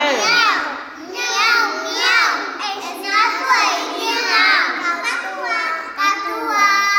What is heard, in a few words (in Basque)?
miau